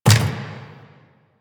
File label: Turret Dry Fire; Lasrian APC